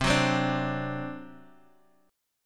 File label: C Suspended 2nd Flat 5th C Guitar Half Step Down